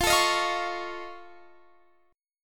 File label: F Augmented 7th F Guitar Standard, chord; F+7 strummed; x,20,19,20,18,21; JGuitar